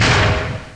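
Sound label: metal explosion; CD Action; soultrap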